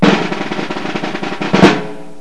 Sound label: Drum rolls; Olduvai Sounds